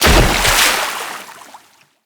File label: Tenno Land Heavy Water, Footsteps; Characters